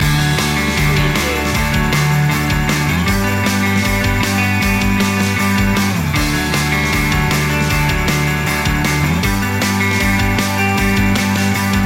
free flowing loop

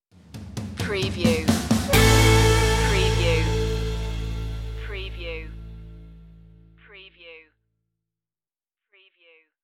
heartbeat stinger

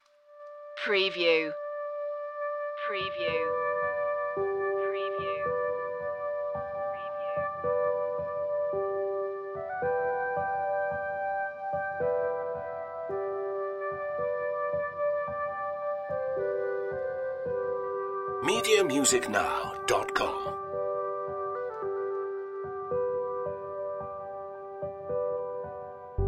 the softest steps no synth

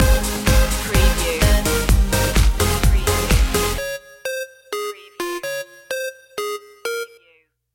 starship dance stinger

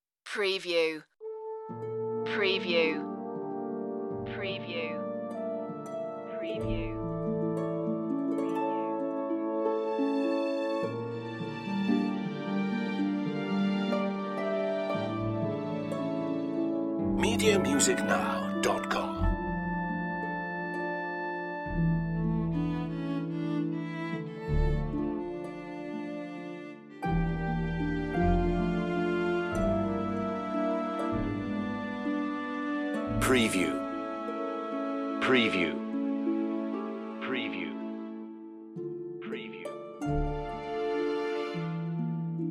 orchestral moving sad

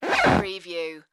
creaky floor

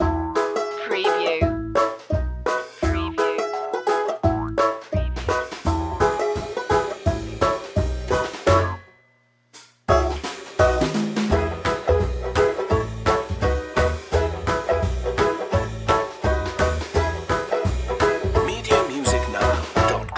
party barn banjo loop

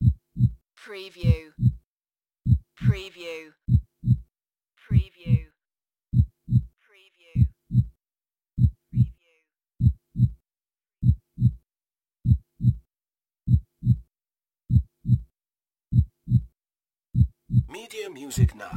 Heartbeat several

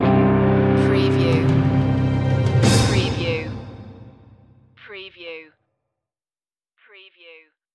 lone wolf stinger

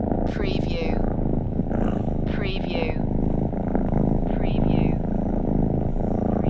Cat Purrs Long Constant

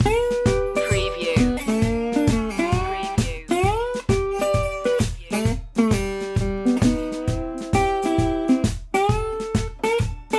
belly up blues loop

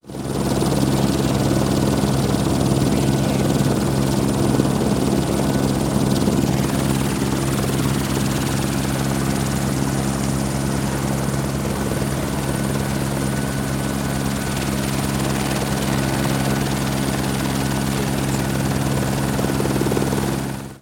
Helicopter Idle Lift Off

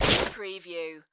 Shovel Dirt Dig; sound effects bible

